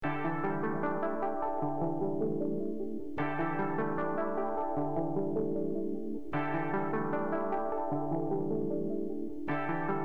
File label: arp